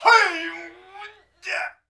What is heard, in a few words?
Kart Skill; Seer; GamigoNA